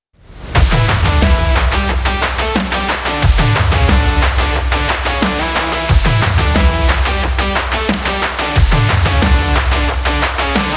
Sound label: Extreme Sport